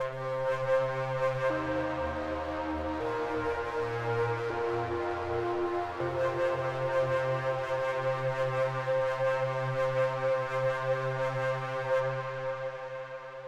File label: Overlay Tone; Savant Extraordinaire